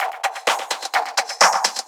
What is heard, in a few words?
FX Loop 128 BPM, House